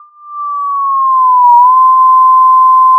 Sound of a police car siren

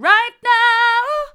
right now hard, vocal

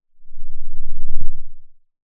Sound of a lion’s roar

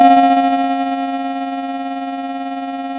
pop, Sound of a doorbell

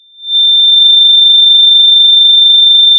Sound of a tea kettle whistling

